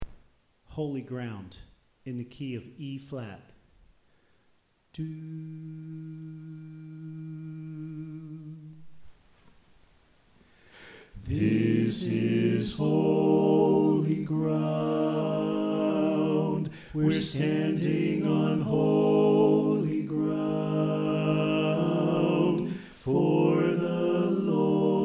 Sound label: Tenor Left